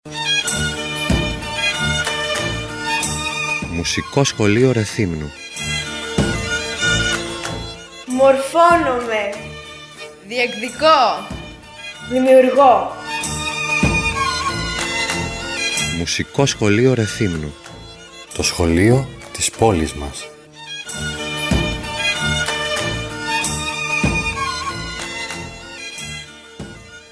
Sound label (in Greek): spot mousik